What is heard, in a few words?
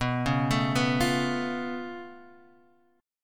C sharp Augmented 7th B